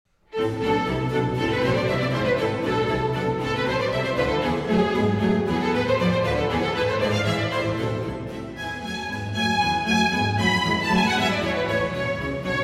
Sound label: Concerto For Strings in A Minor Allegro